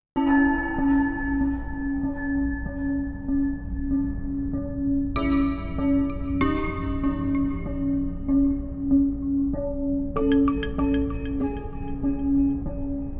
Clock Music; Hector Episode 3 Beyond Reasonable Doom

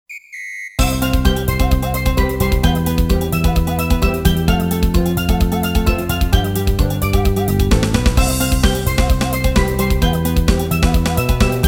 Puchiguso Race Victory Melody; dot hack

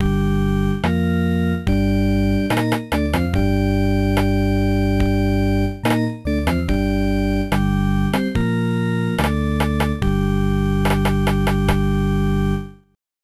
Death; Space Quest 2; Apple

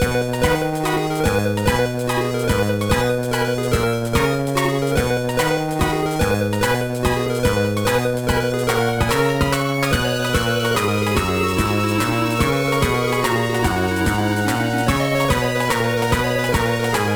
BGM; Ohanaya-san Monogatari GBA; Iyashikei Ohanaya-san Ikusei Game

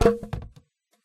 Chair Hit; hello neighbor pre-alpha alpha 1

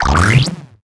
Rouge Evasion; Inotia 3

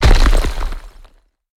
Explosion Crunch; Boomerang Fu

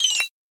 Remove Point; Boomerang Fu

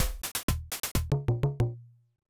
Lose Life; Paperboy; Genesis

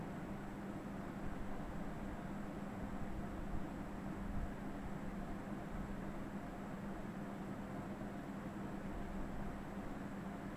Ambient Hallways; Among Us

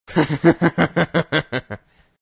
Laughs; Sonic.EXE; Tails' Nightmare 3 Demo

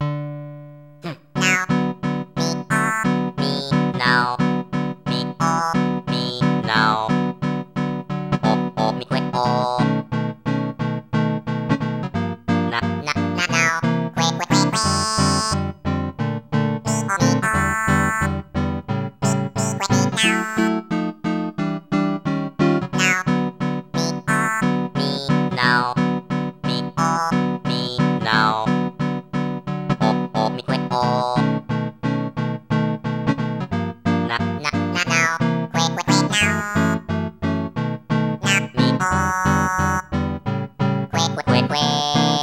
Jazz, Live; animal crossing let's go to the city

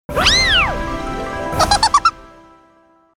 Passed; OvenBreak 2; iOS mobile

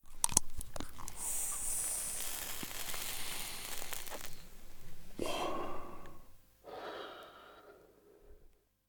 Pipe; The 39 Steps

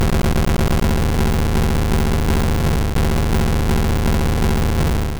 Flame Wheel; sfx; attack moves; pokemon; gen 2; gsc

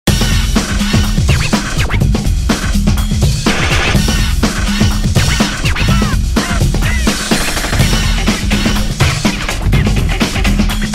race; D1 Professional Drift Grand Prix

